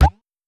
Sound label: card multiplier update